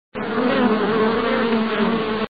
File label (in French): Abeille